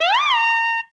alert, zaps and beeps

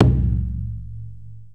Toms, Real

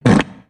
fart short

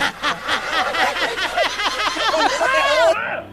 laugh evil echoes; ooh ahh

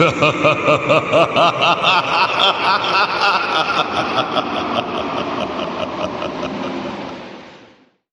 laugh evil

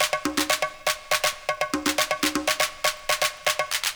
freestyle bongos, drum loops

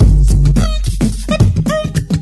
Beatbox, Drum loops

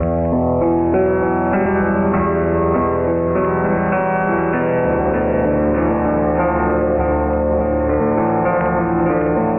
piano thoughts and regrets, Sad

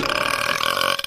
burp medium

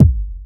puncture, Kicks Electro

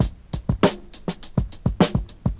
landlord, Drum loops Boombap

